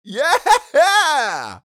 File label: Male yeah reaction